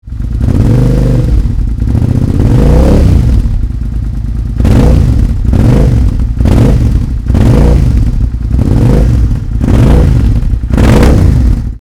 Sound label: sv650 sound, exhaust